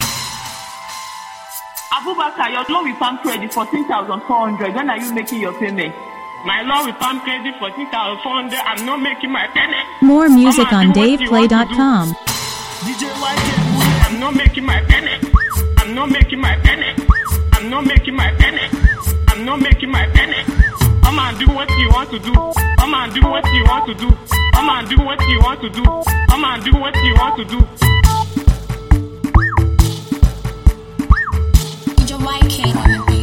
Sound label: Beat